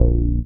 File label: 106 Bass A# One Shot; Drum & Bass